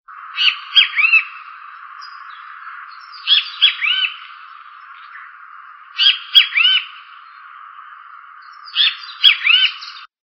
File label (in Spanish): Benteveo